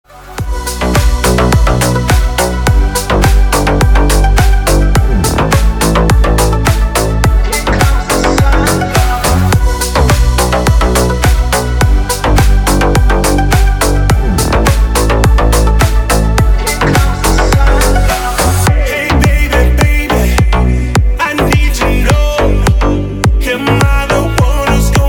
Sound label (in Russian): zarubezhnaya muzyka